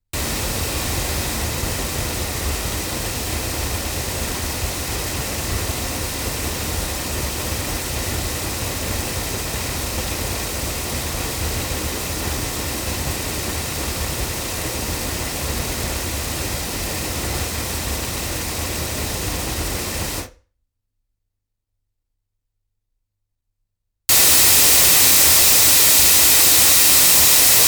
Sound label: ADK A51TL hypercardioid loudspeakers